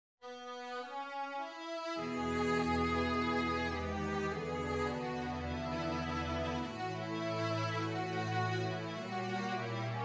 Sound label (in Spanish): bajos; El libro de la Selva